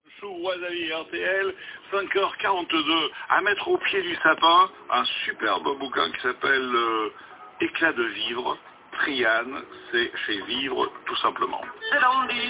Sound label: Vocal